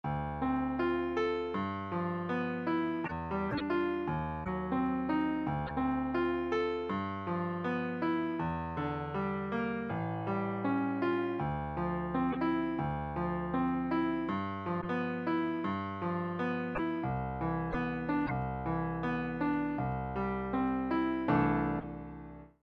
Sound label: tree arp